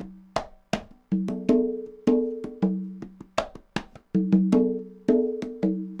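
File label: congas bongos 80 bpm, latin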